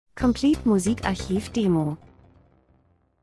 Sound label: transition; Transition & Movement - Sequenz Delay spannend